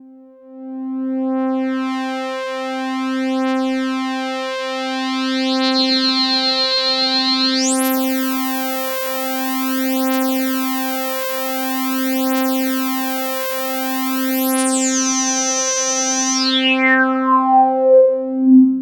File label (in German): OB-X8 Filter Sweep SEM LP volle Resonanz